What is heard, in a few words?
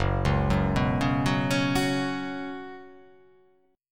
F sharp Suspended 4th